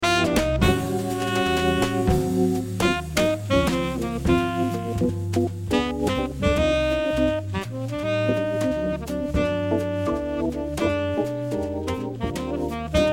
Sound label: quartet